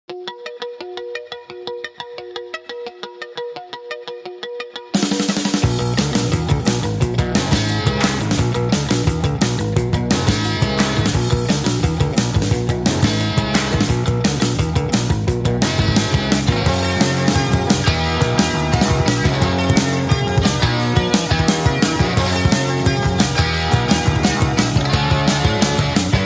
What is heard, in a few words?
No Solo Guitar